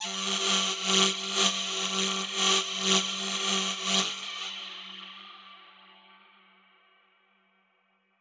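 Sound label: Reverb Proc, alarm siren samples, 120bpm; musicradar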